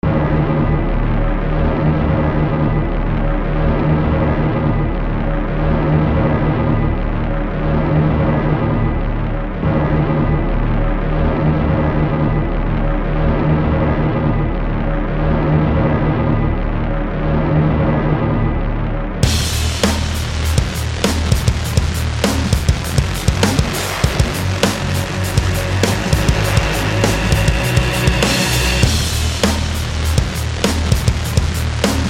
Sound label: Train Fight